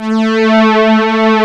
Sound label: STRGS A2; East West; Global Sounds; JX